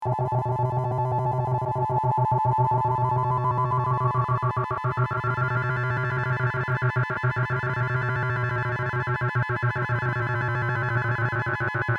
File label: Weird Modulated Drone; analog synth